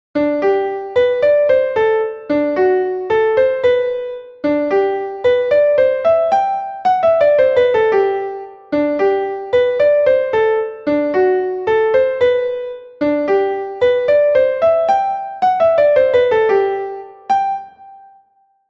Simple samba

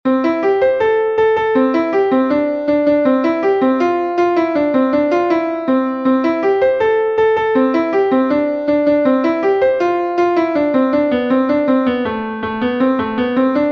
scottish quebec scottish

